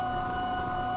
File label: Motor, Train; OpenBVE